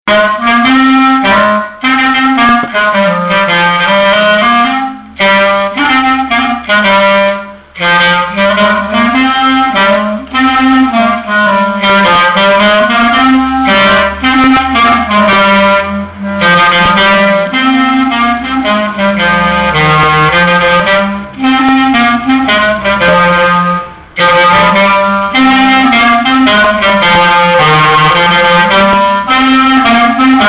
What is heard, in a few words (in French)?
marche, clarinette